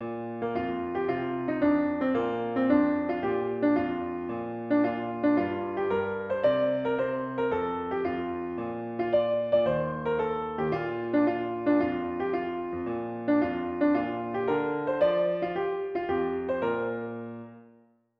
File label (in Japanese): ピアノ